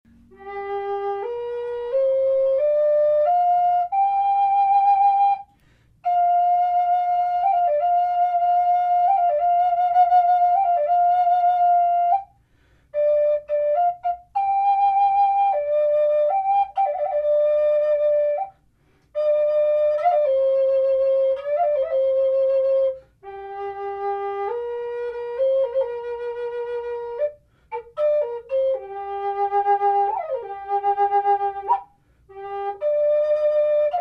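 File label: natural, flute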